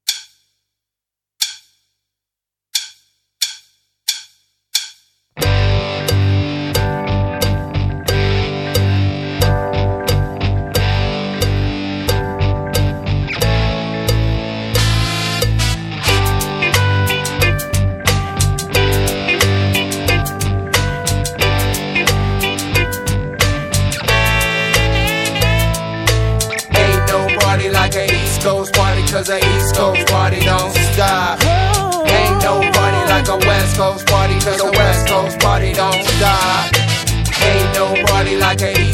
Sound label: G2 NO DRUMS